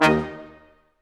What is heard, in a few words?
BRS Quintet stac; Roland LCDP08